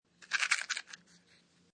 Match Box Shake Matchbox; Shaking; Objects; Lynne Music

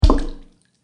Water Puddle Splat; Water Sound Effects; nature

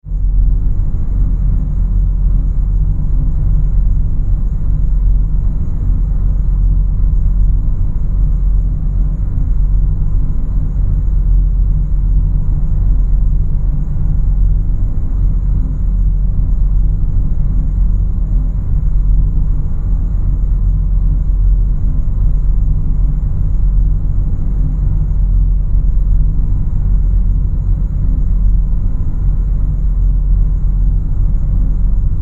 Drone, Factory, Spooky, Horror; 5.1

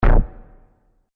Multimedia Button

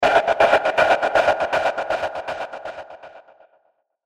Chopper Delay Pan; Blastwave; Chopper Sound Effects

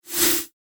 Sweeping Laser Scan; whoosh; Whoosh Sound Effects; science fiction sound effect; star wars / trek style games; Andrew Fleming

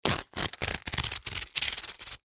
Perforated Paper Rip Tear; Materials; Office; Blastwave

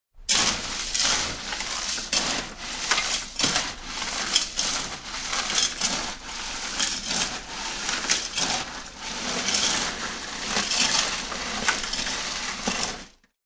Ice Machine Dig, Digging in an ice machine; Human

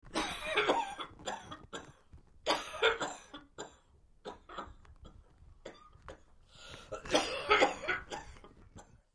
Coughing Female; Clearing Throat; Human

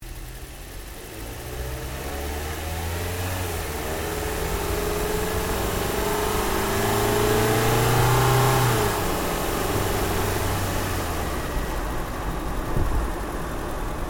Car Toyota Yaris engine drive; Toyota hire car; Cars (Sound Effects:Vehicles)

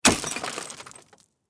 Impact Automobile Window, Shatter; Glass; Crashes; BlastWave

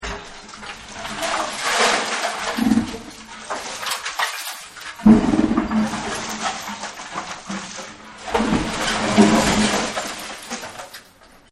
Growling Debris; Materials

